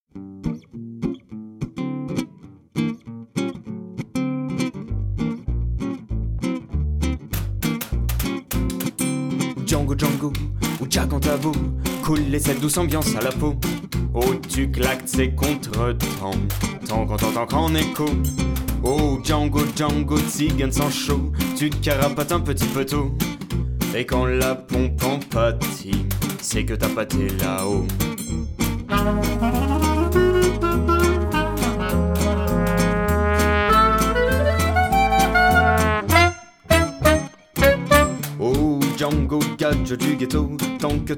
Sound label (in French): douce ambiance, klezmer